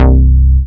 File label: Offbeat Bass C; Vengeance Samples